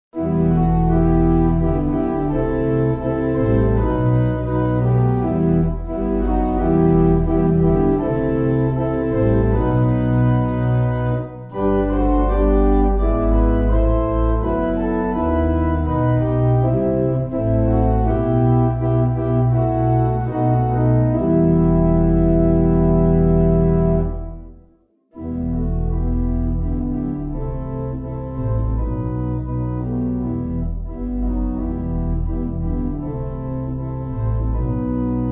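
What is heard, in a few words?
Pipe Organ, Music Backing Files for Churches; Kind and merciful God; Elfaker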